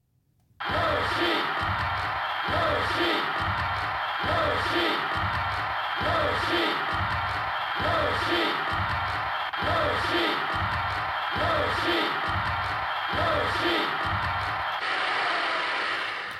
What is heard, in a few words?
Crowd cheer; Yoshi